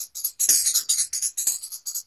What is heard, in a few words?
LOOPS